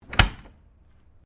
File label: Dishwasher Door Close, Household dishwasher; Dishlex; Appliances; Sound Effects:Household